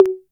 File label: DR-660 Perc, Drums, Boss DR-660